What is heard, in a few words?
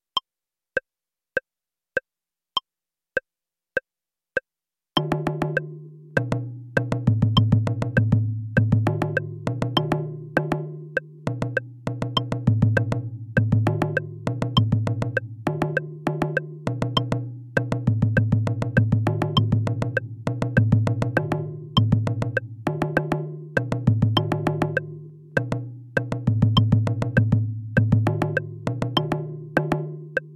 hocket 100bpm, taiko